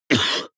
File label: cough male single attenuated soft short; koerpergeraeusche